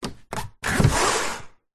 open windows; house

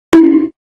hit sound effect

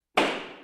court hammer

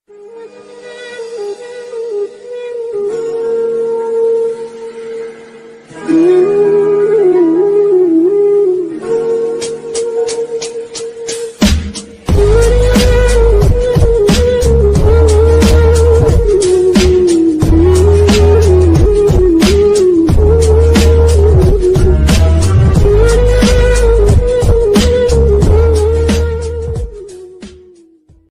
Sad Ringtone

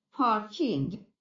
elevator parking

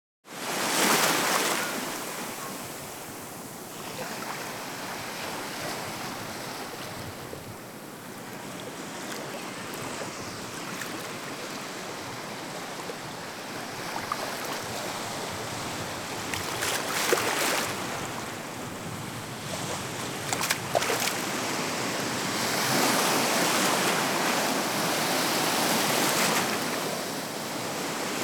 Sea Waves sound

